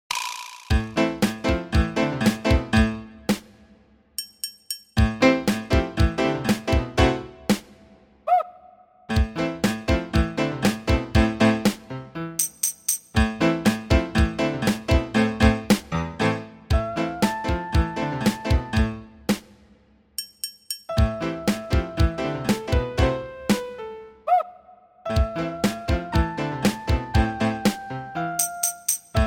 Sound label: MIDI ditty